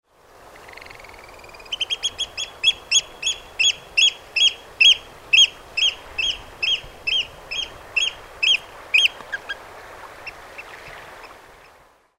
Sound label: oystercatcher